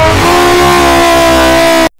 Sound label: really strong scream